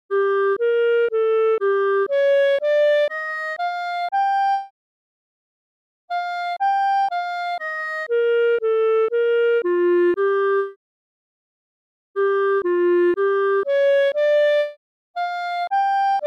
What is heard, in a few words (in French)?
poemes musicales